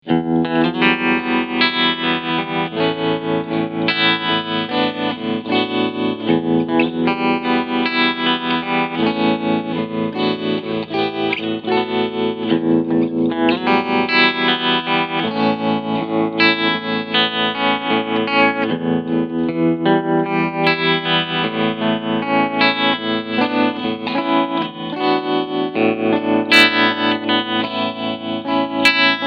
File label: Tremolo Black Face; SurfyTrem; Regler 12 Uhr